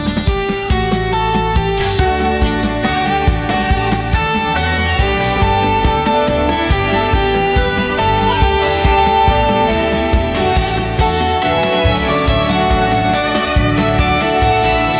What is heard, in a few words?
uplifting soft rock; Weather Channel Music Generator